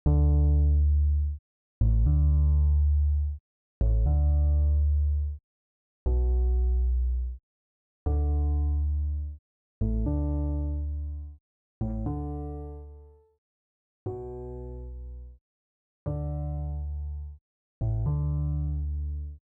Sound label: Bass